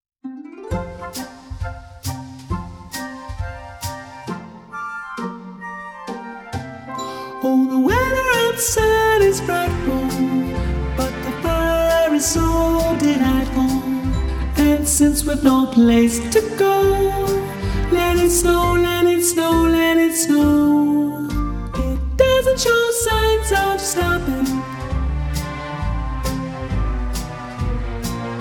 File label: ALTO